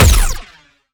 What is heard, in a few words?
Sciweap Shot Medium Laser Rapid Stun Gun